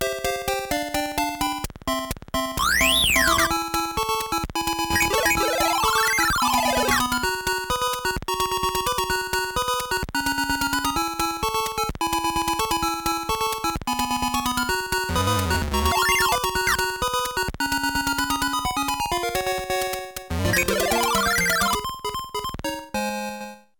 invincible time bgm ~ deck bgm ~ stage clear ~ deck bgm ~ prayer mistake; Capcom Game Music Volume 1